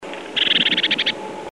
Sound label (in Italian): Cincia azzurra